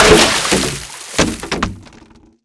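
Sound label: destroyed watercooler; Explosions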